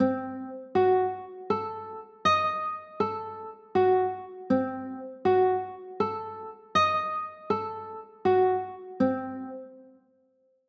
d sharp dim7, chords

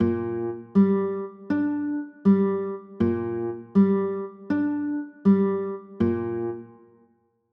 b flat 9, chords

